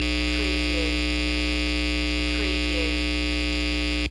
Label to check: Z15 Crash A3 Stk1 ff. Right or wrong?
Wrong